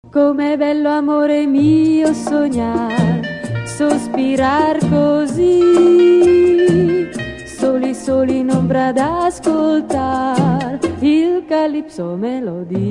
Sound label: Calipso